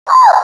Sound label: crossbills flight